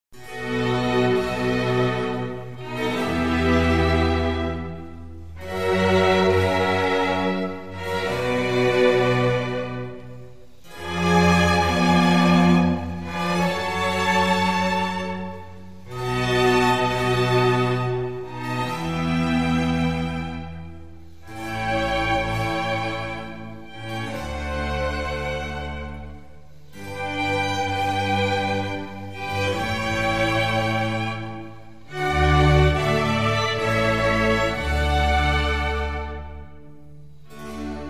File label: Concerto for Strings in G major; RV151 Alla Rustica; Antonio Vivaldi